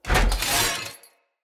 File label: Sword Heavy Attack Charge; Weapons; Enemy; Swords; Mnd King Swords